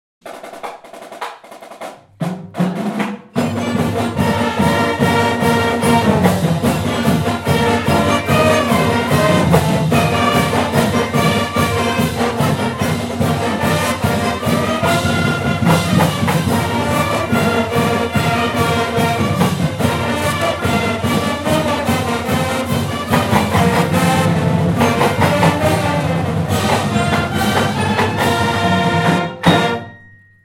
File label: Fight Song, Band